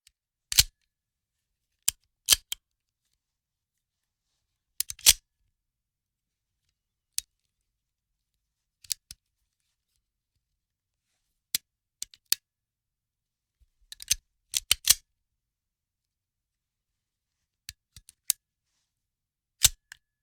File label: handgun magazine loading